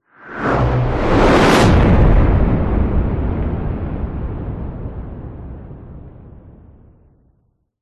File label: sound design cinematic whoosh massive heavy fast close; zapsplat